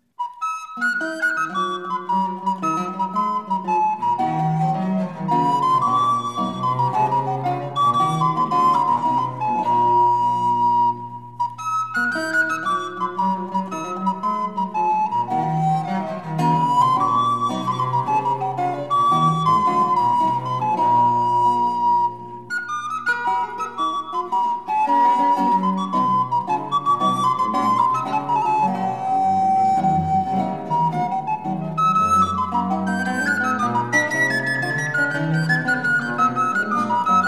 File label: Suite in F Minor, Gigue, Recorder; VII. Gigue